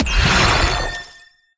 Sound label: Rankup